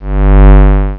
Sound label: ALIEN ENERGY; Marathon revisited